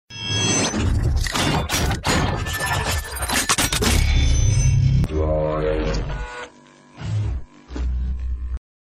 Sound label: Droid Transform